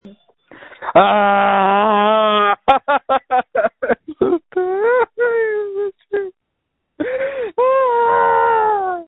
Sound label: Scream